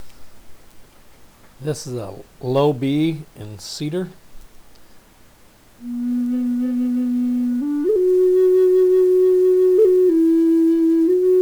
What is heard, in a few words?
cedar low B, flutes